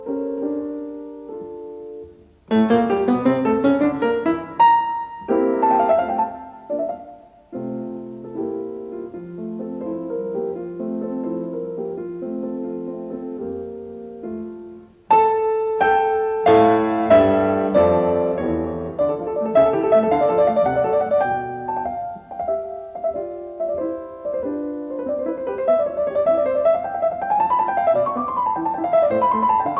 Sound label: Piano Sonata in F major Allegro assai; Lili Kraus